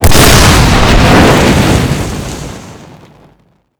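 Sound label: explosion helicopter flame up medium; Explosions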